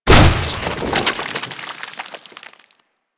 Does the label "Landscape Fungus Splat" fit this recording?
Yes